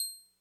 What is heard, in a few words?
Clavisynth C6, TX81Z; Electrophones